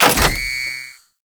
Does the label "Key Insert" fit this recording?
Yes